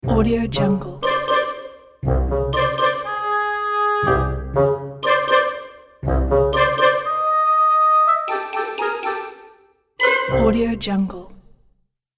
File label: awkward logo